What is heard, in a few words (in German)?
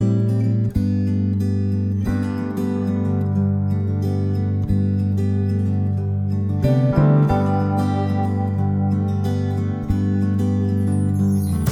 Playback ohne Backings